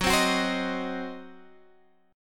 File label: D sharp Minor 6th F sharp